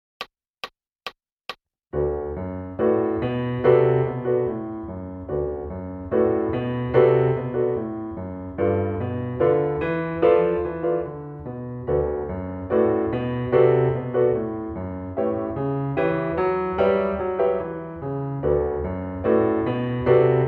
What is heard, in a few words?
Piano, Boogie Woogie